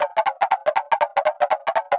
120BPM SWING; Bolder Sounds - Granular

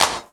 CLAP HOU, Dance Mega Drums; Best Service

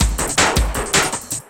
Drum & Bass, 160 BPM

